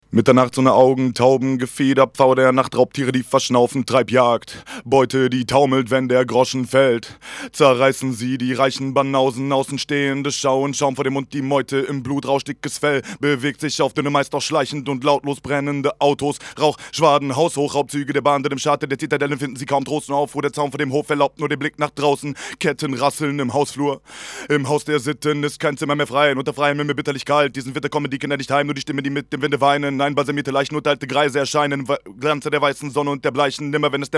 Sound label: A Cappella Rap